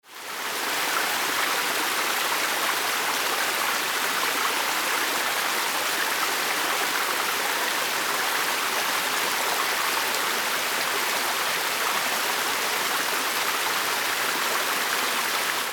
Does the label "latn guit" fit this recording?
No